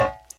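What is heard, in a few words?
ringing of raindrop on metal pipe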